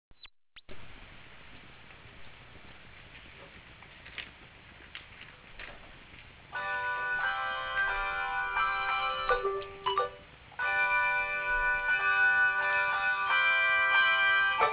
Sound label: Bells